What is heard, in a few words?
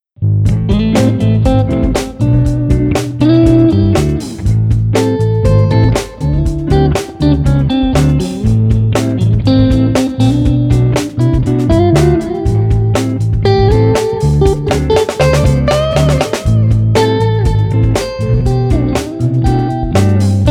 Street Funk Jazz 120